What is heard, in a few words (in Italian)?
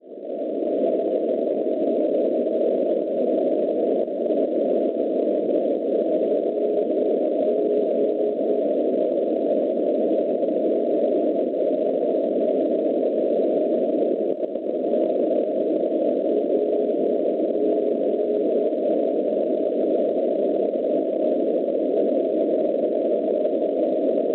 NDB, Rygge Norvegia 381 kHz; RG; Schwarzsee